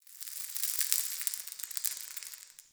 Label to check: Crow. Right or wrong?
Wrong